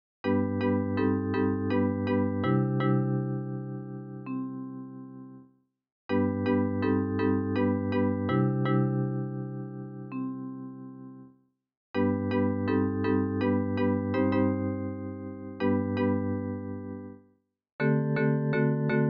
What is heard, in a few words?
lullabye